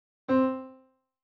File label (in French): do piano; clef de sol